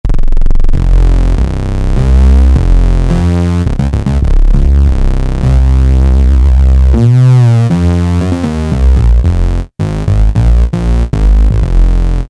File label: Fat Bass, Korg MS2000; Factory Patches